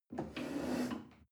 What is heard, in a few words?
chair wood take slide soft